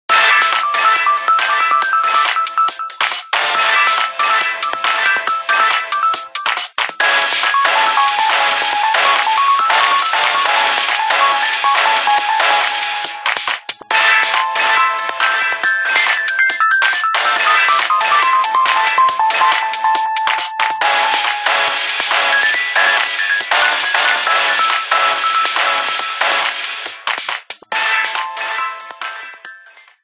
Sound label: Ring Lounge; HTC Touch Diamond